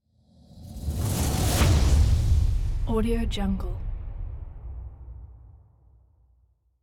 fire logo reveal; moonaudioproduction

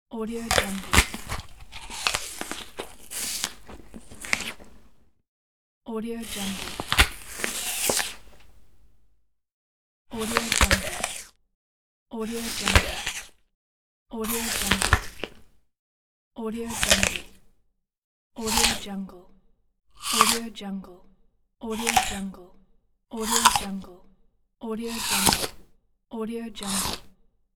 crunchy apple bite

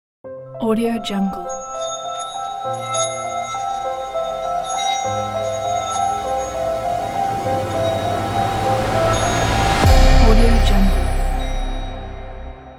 massive piano logo; enderguneymusic

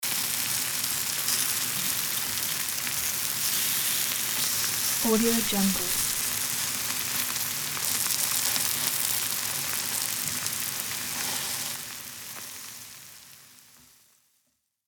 frying

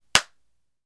hand clap single hits

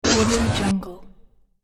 robotic anatomy

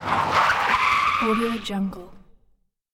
car braking

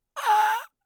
death gasp pained gasps short inhales

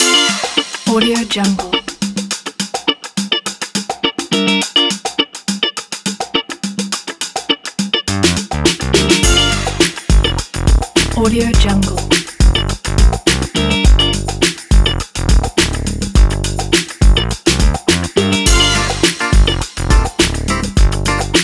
groove; villatic

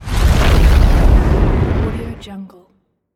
fire flame

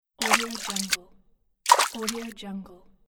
footsteps on water

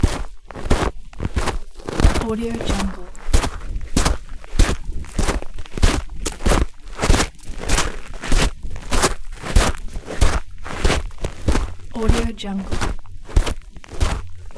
walking on snow loop

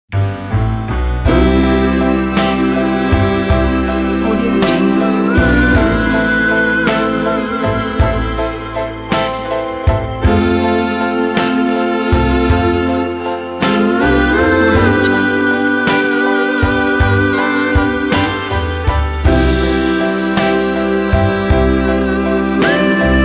50s slow doo wop; applehillstudios

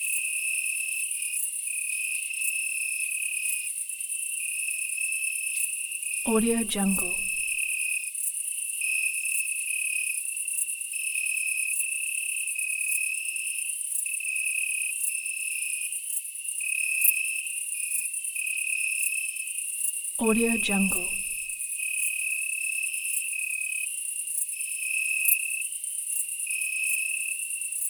cricket chirping loop

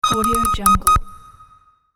telemetry pulse; sound ideas

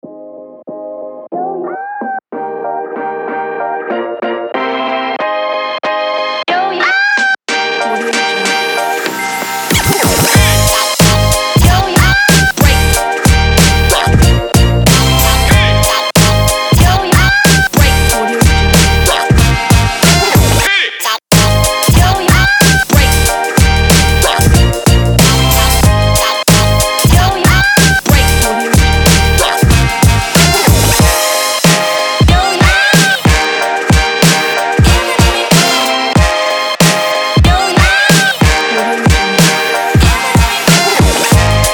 boom bap hip hop

